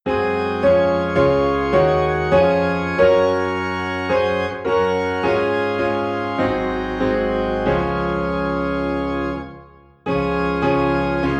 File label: Piano and Organ in D Flat; Lead On O King Eternal